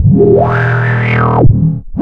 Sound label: FX FILTER R; Big Beat Samples & More; AKAI; OTHERFX KIT